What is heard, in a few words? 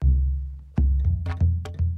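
Calm Far, music; From Dust; PS3; Xbox 360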